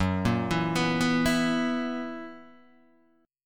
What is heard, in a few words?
Gb 11th, Guitar, DADGAD